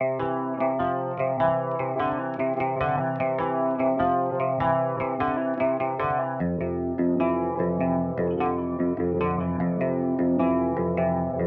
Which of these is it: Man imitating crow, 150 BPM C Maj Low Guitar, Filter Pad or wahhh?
150 BPM C Maj Low Guitar